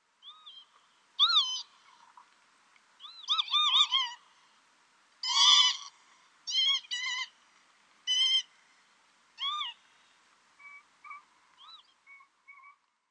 tageri, watarase

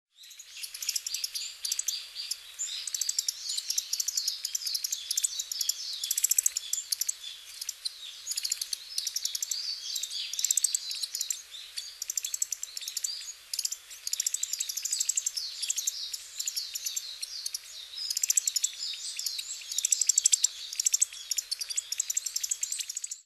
yamagara, hyogo

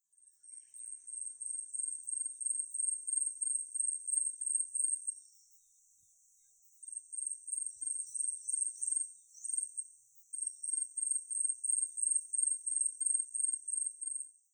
yabusame, yahaba

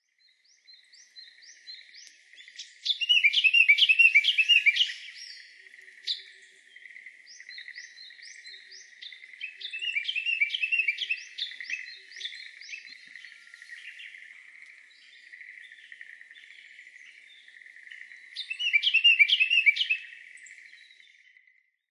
uguisu, nasushiobara

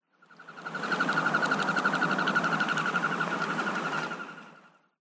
hojirogamo, abashiri, koe

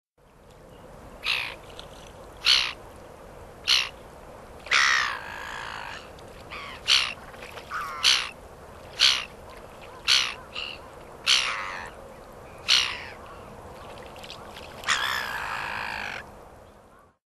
yurikamome; hyogo

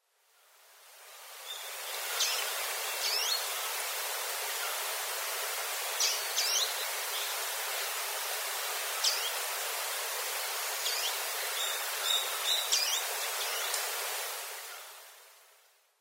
karafutomushikui, sakata, koe

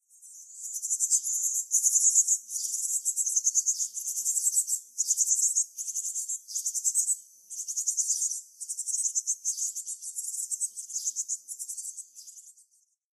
sijyukara, utsunomiya

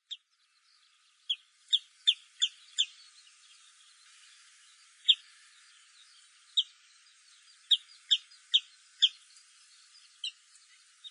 takabu, saga

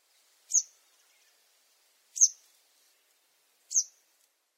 kitatsumenaga; koe; sakata

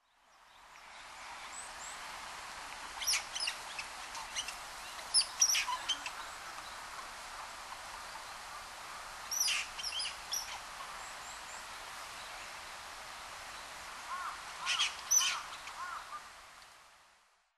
ezorisu, maruyama

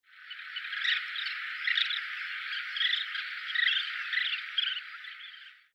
medaichidori; tatsuno; koe

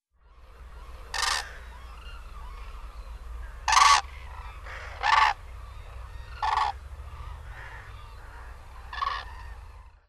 nabezuru

